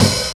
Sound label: OP HAT; East West - Phat & Phunky CD2; 90's Sample CDs